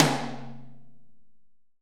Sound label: H AMB H TOM, HALL AMB TOM